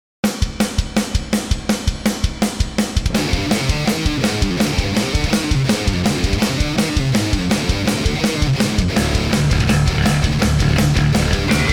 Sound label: Snakewalk, Riffs